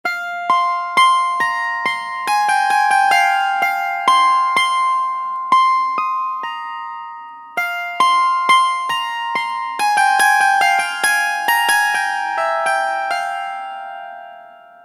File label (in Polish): Psalterium